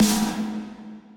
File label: snare